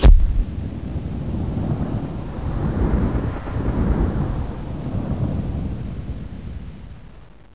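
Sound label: shockwave; duke nukem total meltdown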